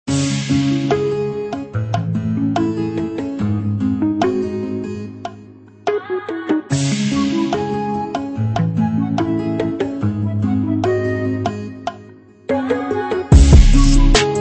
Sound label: Trap; HOOKSHOW Mr. Scotts